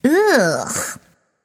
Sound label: "ugh"; smite; voicepack; nike